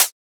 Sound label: Closed Hat; FLPRO